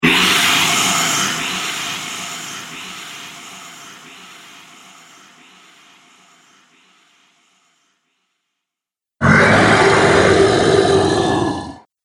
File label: Three Dragons, monster; horror